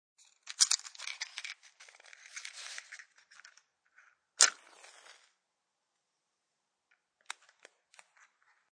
Matchbook sounds with fire strike, flame, and out.; Part of Flames (Sound Effects:Objects)